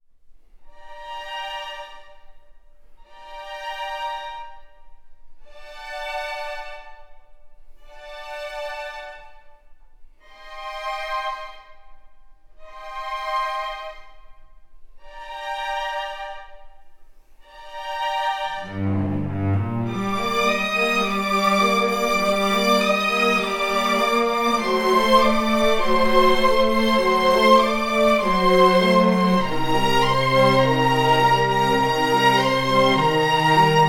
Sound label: Sad Theme